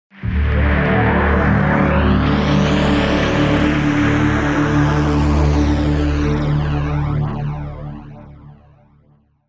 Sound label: big swell